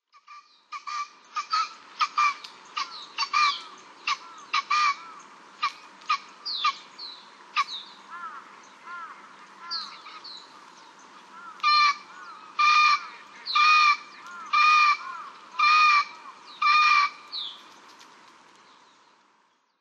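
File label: Green Pheasant; koe; kizi; watarase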